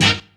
D2 HORN

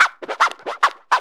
SCRAT; E-Lab; X Static Goldmine 2; akai

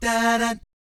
DAH DAT, Jazz

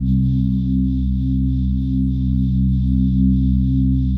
PAD, ATMO PADS; Best Service; XX Large

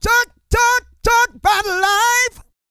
SING

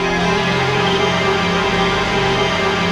ATMOPAD; Best Service; XX Large; Pads

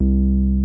TB303

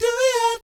DOOEEOT, Vocal Jazz Fx

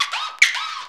SCRAT, VNL FX; E-Lab; X Static Goldmine 2; akai

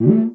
PERC

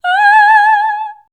G#5, Voice Spectral

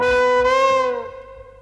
STAB

